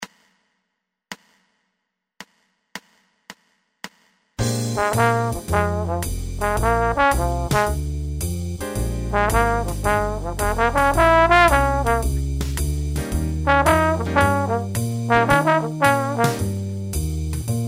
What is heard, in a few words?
swing